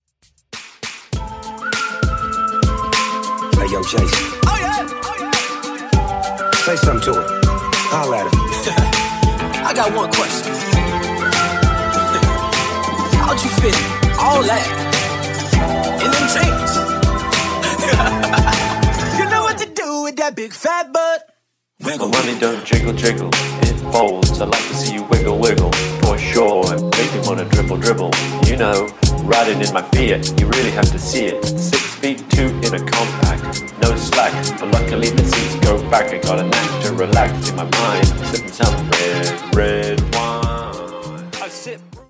Re Drum, 100

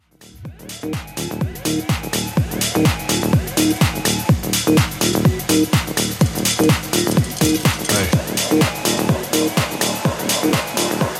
House 125Bpm; Mashup

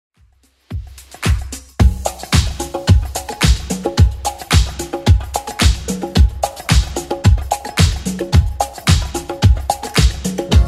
80s Club Edit 110pm; remixplanet

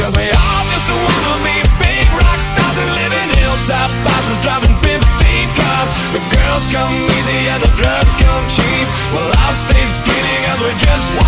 160 80bpm Redrum Slam End

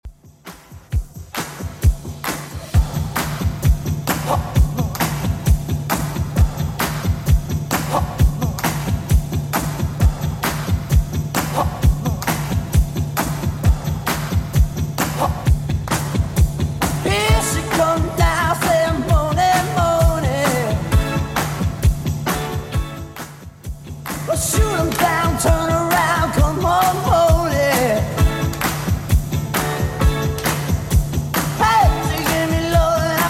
70s Redrum 132bpm

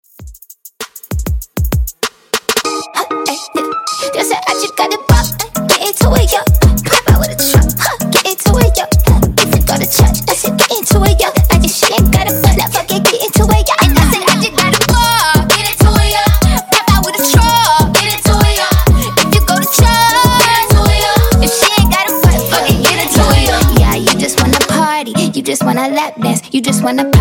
Top40 Redrum Clean 98

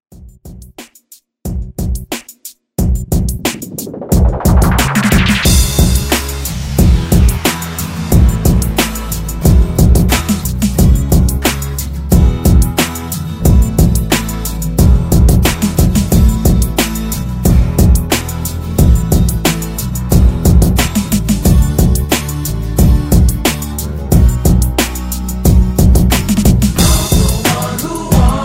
90s Rock Redrum

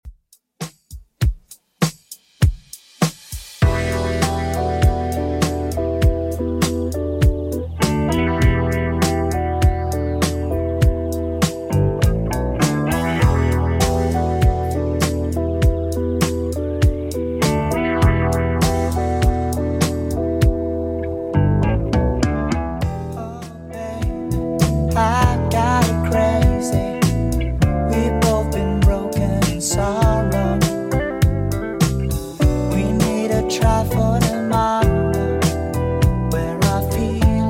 80s Redrum 100bpm CLEAN

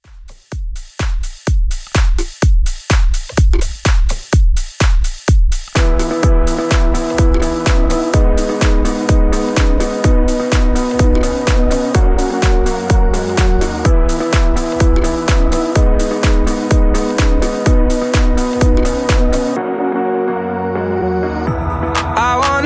Extended Mix 126 Bpm

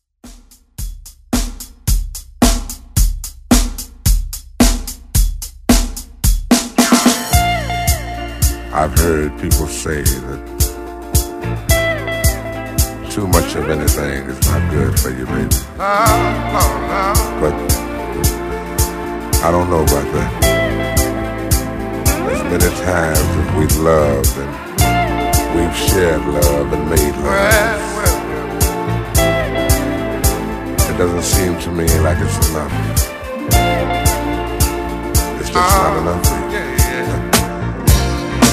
90s Pop Redrum

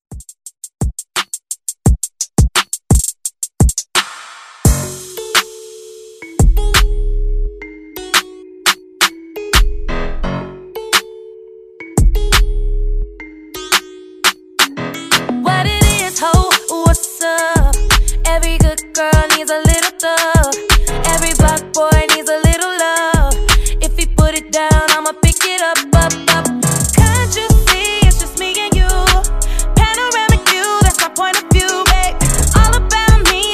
Hiphop Redrum

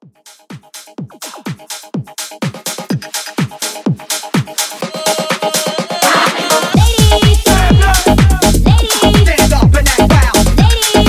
House Hype 125

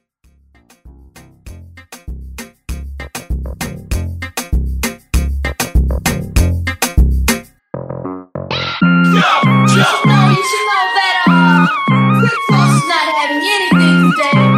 Moombah Mash Up

